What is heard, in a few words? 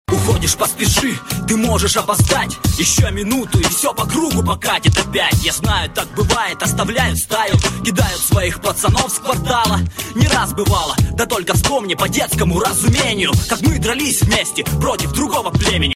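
Russian rap ringtone; russkij ryep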